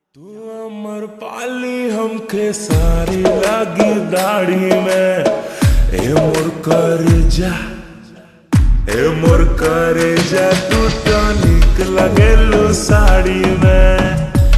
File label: Slowed Reverb Ringtone